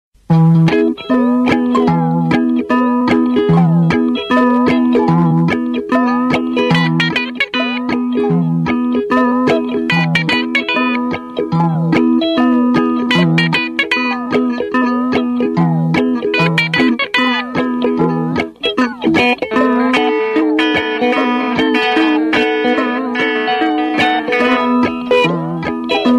sms ringtone

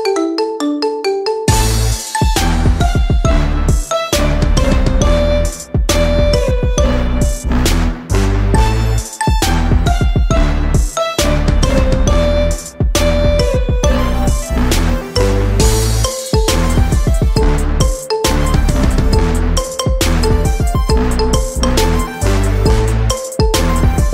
Marimba Remix

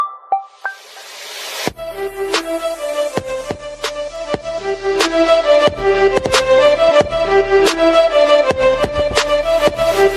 Oppo A57 Future

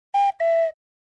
funny cartoon sms

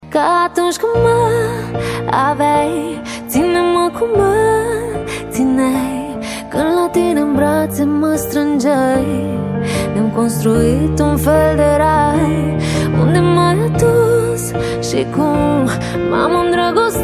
Piano Session

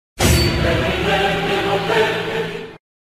Epic Choir Suspense

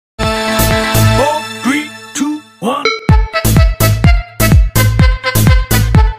Summer Sax

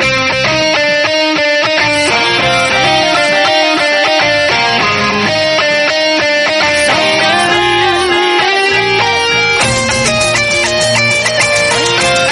Guitar

